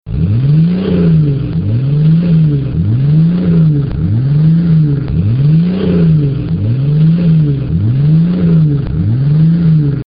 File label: Wrangler Unlimited 4.0L Swept Side